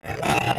ALPHA ORC DOG Growl

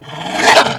ALPHA ORC DOG Attack

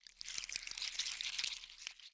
ambient

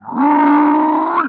DIE

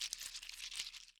WALK

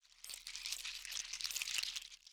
ambient

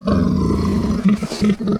WARN

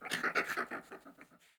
RAT AMBIENT

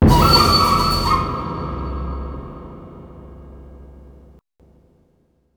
THRILL JINGLE

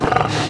attack

